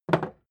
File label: hammer wood put down